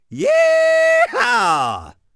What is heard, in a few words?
Yee Haa